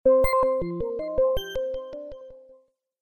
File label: video stop; bitrix